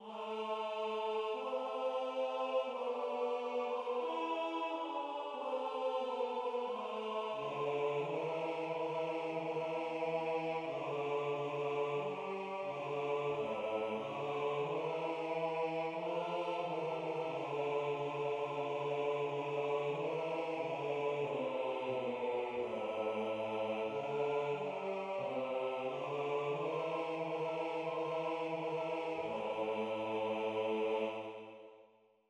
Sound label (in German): Schoenster Abestaern Bass